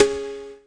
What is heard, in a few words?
COWCLONE, Electro Soul Kit